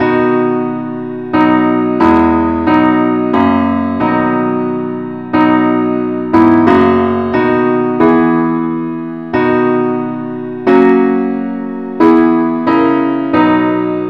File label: parts only, key B; christe Sanctorum